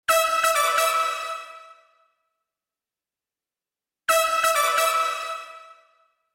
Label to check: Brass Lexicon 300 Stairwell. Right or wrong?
Right